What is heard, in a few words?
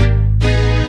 Strings